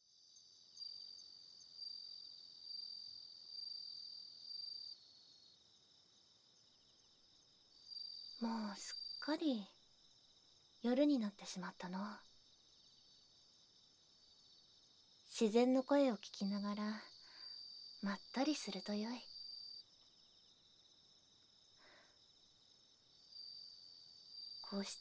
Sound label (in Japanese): 耳かきと、耳舐めと、寝落ち